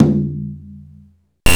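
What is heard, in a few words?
DRM HYBRID, Africa; Roland LCDP14 Africa VOL-2; Afro Menu 1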